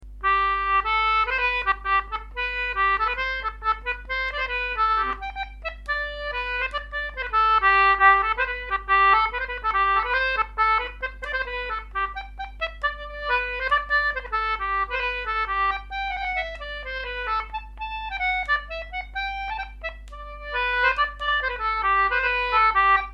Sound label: Concertina; Jamie Allen